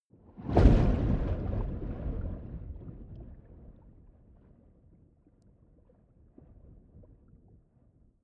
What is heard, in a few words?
whoosh abstract fast long